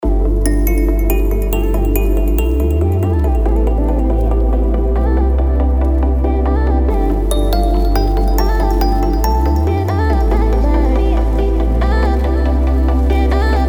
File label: Euphoric Future Trap; Vanilla Groove Studio